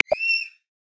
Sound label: Red Panda; Xiaomi Redmi-Note-8-Pro; South West Mountain